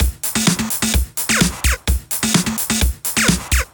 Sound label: House Beat, 128 BPM